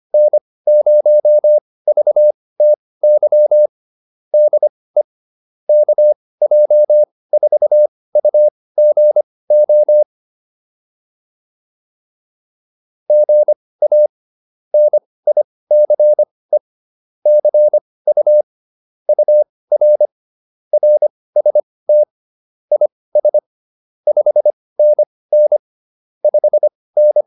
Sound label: QSO, intermediate practice files